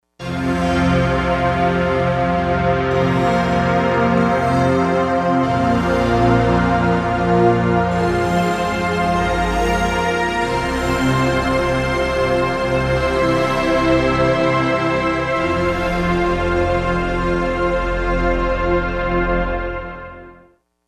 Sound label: Chime or Chest; Digital Synthesizer